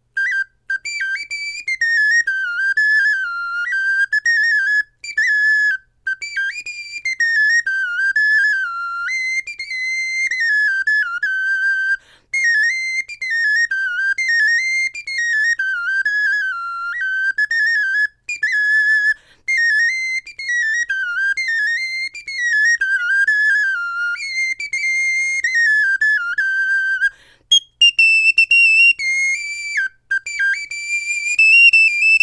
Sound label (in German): melodie, knochenfloeten